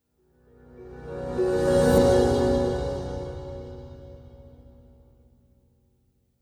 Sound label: Corporate Transition